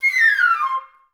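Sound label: flute run down C3; Best Service; Peter Siedlaczek's Advanced Orchestra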